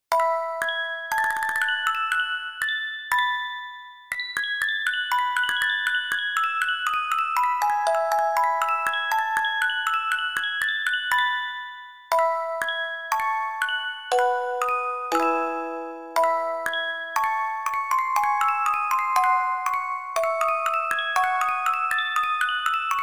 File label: gavotte; オルゴール チェレスタ ミュージックボックス; 無伴奏ヴァオリン・パルティータ第3番より ガヴォット